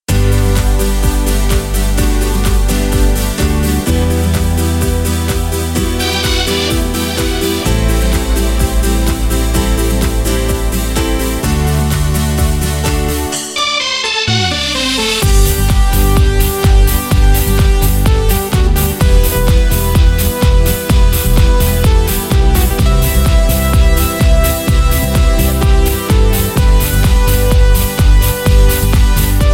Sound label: Korg Pa3X new sound Disco; Disco Tornero DEMO